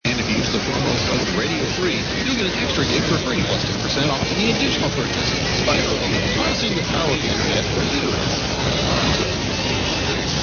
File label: New Bern NC; 1490 WWNB